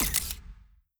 camera collect shot; player

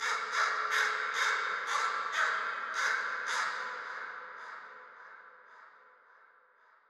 crow, ambient, nature, birds; Portal 2

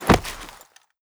body impact

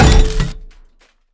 turret takes damage; Counter Strike Model Pack; CS:GO; survival

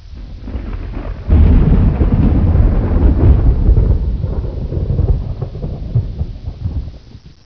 thunder; Counter Strike; CS:S; weather

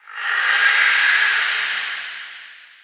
inside battle antlion, prison; Half Life Model Pack; Half Life 2; ambient levels

